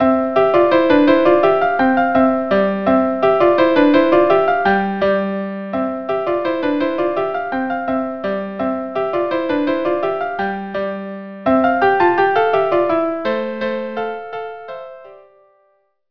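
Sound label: 4 branles violin duo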